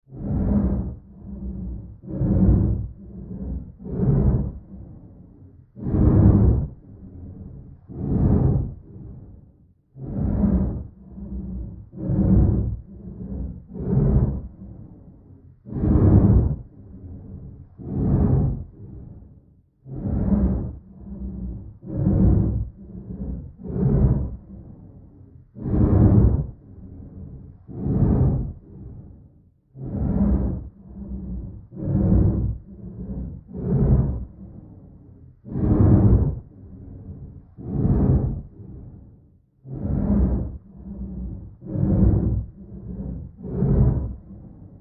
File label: Animal Breath; Eerie And Low, Close Perspective. Use For Monster.; Animal Vehicle Growls